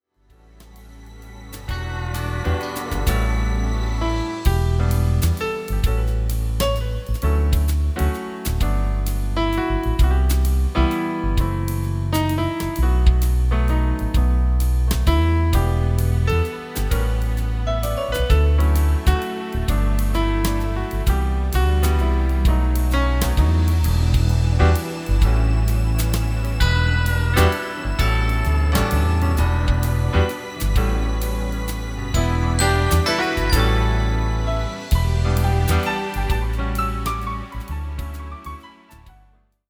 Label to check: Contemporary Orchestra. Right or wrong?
Right